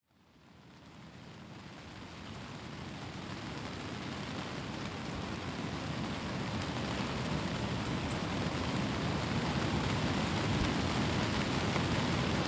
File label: rain and surf